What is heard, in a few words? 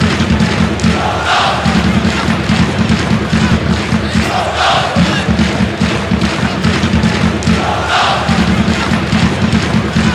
clap